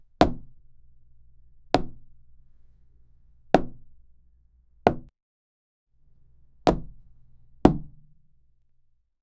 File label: a soft object fall